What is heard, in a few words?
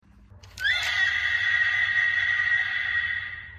fox; geats